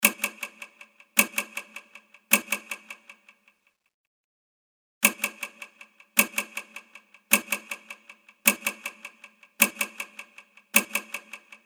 countdown; absinthemedia

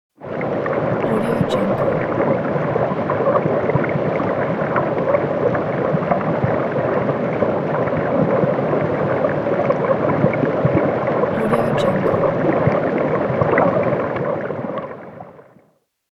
underwater loop effect

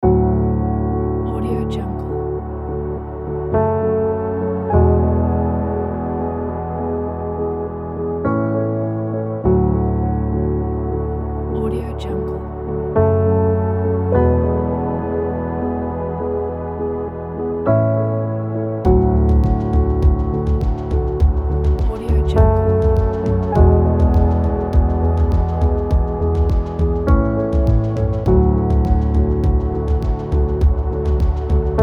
inspiring voiceover background loop

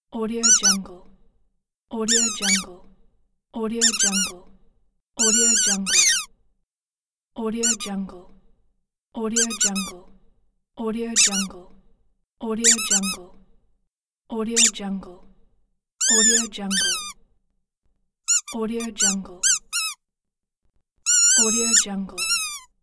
squeaky toy; eternal-future-productions

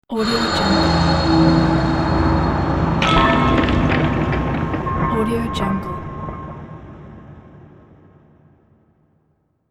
fantasy dungeon quest ambience

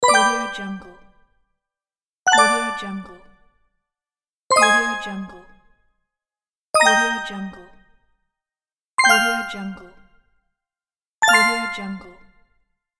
collected item

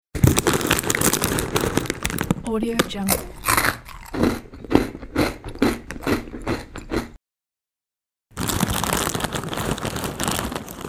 eating chips

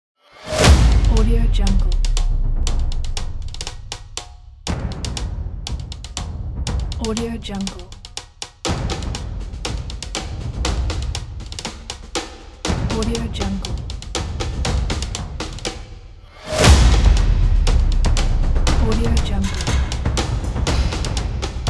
combat drums

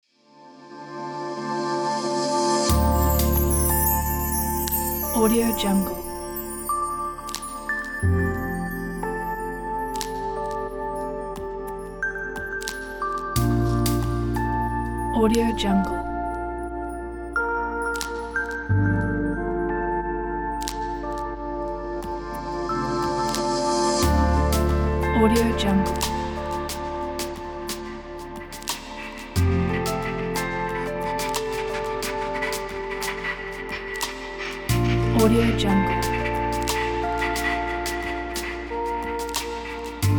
clean inspiration

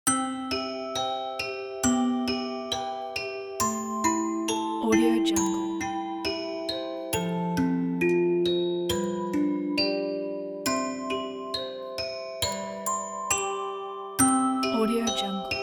storybook fairytale

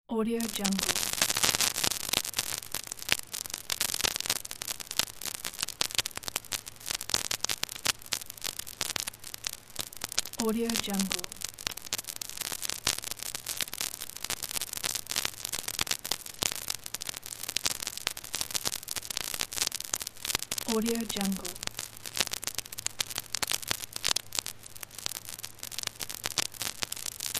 record static noise

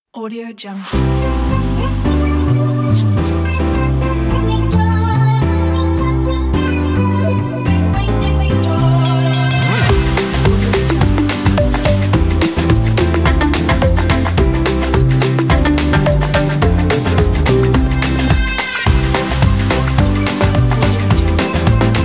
happy children joy

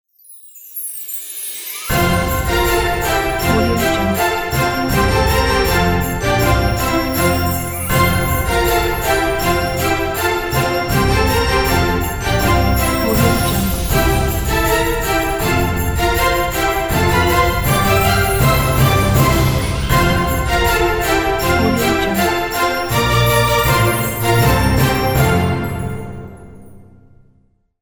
deck the halls christmas orchestra

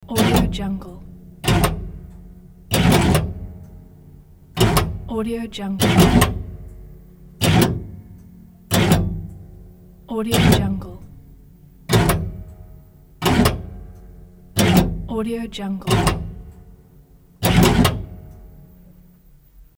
metal clunks latch and springs

